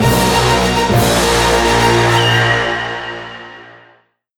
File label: Failure Sting